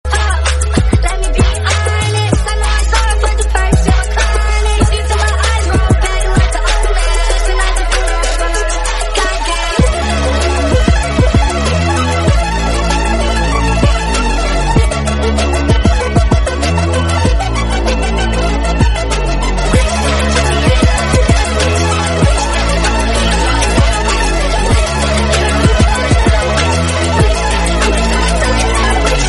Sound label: nightcore